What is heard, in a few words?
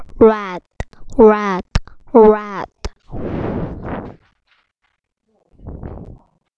laugh